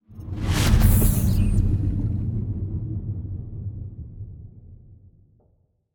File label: cruiser move; aquatics